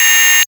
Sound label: Negative Notification